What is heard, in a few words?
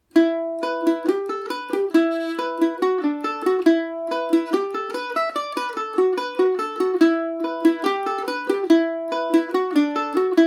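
E minor, Irish mandolin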